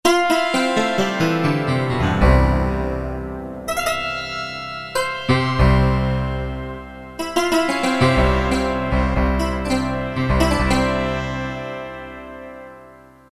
Sitar, Roland MT-32

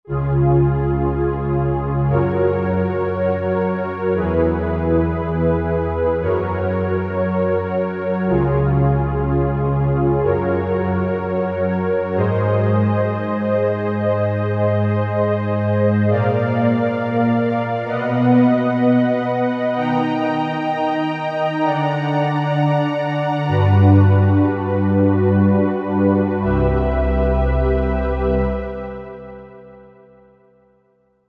Gluey Pad, Roland JV-2080

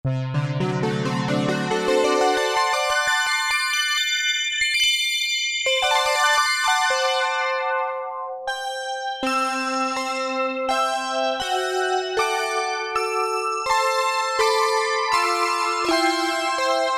Synthe Harp, Korg PolySix; Factory Presets Bank B

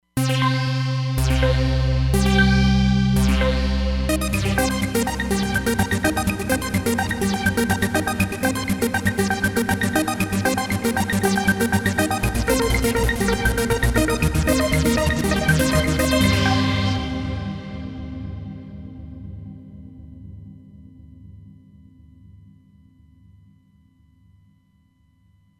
All in One Riff, Roland Fantom XR

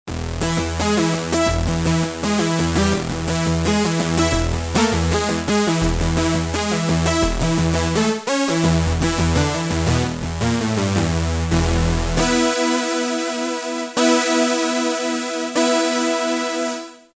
Reso Sequence; Roland M-DC1

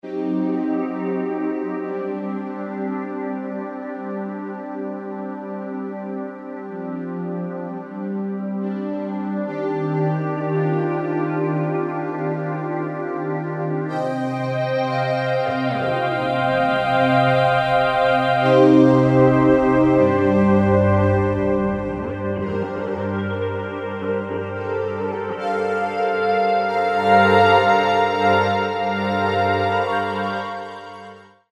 Pad, Kurzweil K2000; Kurzweil K2000 Contemporary ROM; Contemporary ROM Programs and Demos diskette; SYNTHTEX

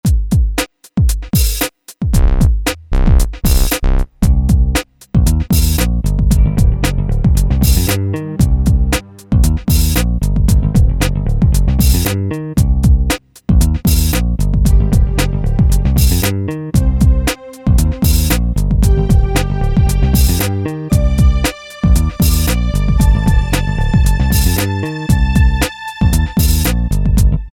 RAP split; Generalmusic Equinox; Grooves

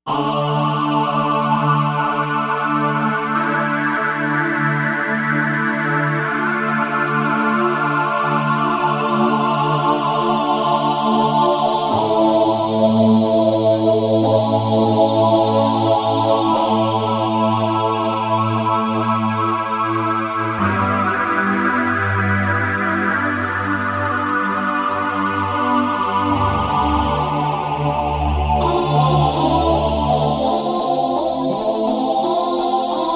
Synth Vox Pad; Novation UltraNova; Factory Patches

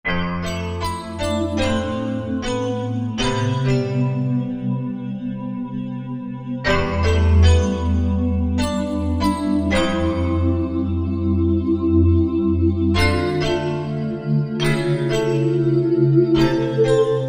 Velo Bells

